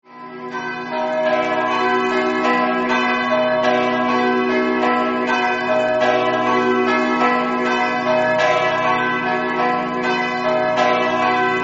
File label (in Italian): tolmino, campane